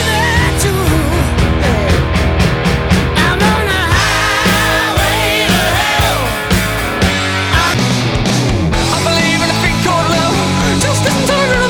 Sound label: Rock; wedding breakfast song